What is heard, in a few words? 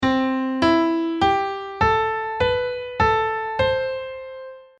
Major Scale